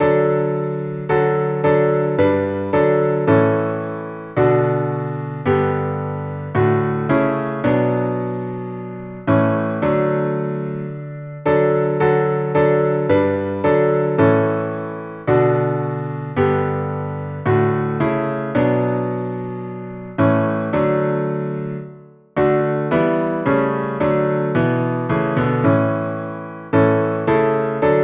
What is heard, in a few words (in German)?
Klavier